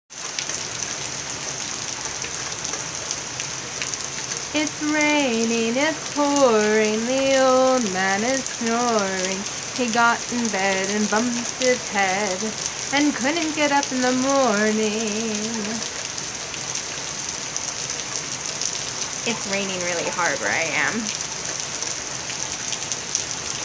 Singing a Rhyme in the Rain

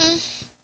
Moan

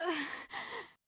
Breath; Lewd SFX

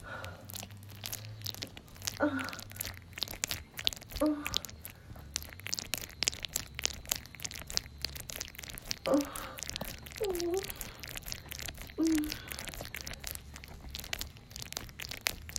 Bad Dragon and Wet Noises

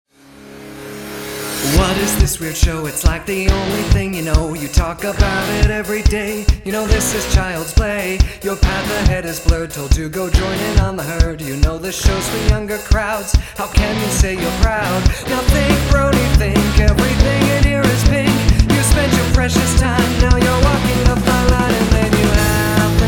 Rock Vocals; Pop-Punk

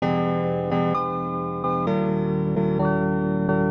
piano

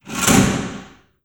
Switch

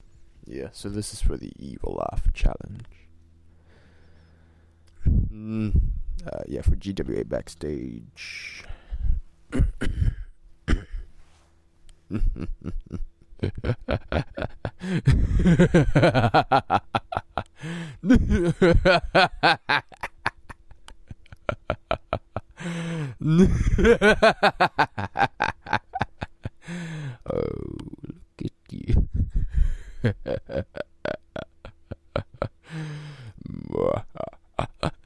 Evil Laugh; GWAB

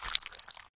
slosh; Lewd SFX

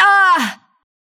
Short Scream; Lewd SFX

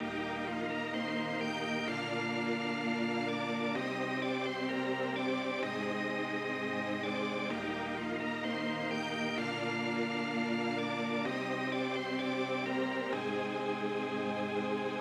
Organ pad, 128BPM

